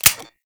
Pistol Empty Fire; Tenno Alt Pistol Empty Fire; Warframe; Weapons

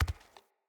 Walk Default, Footsteps; Characters; Enemy; Mockeries; Mockery

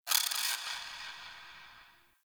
Ext Creature Distant High; Misc Outdoor

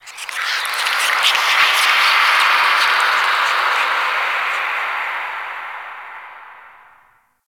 Whispers Small, Ambience; Warframe; Orokin Moon

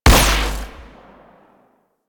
Amp Barrel Fire, Amplifiers

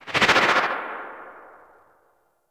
Arch Wing Atmosphere Flyby Right; Vehicles; Movement

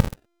Crack Cast; Warframe; Warframes; Khora

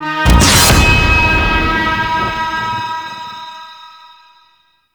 Alerted; Interrogation; Dax

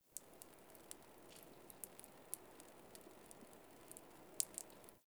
Exterior Water Drip; Warframe; Nineteen Ninety Nine

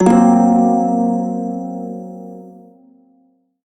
Shawzin Blues Chord; Warframe; Prime Shawzin

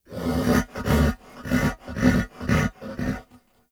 Horse Vocal Mount Neigh; Vehicles; Vocals; Warframe; Duviri

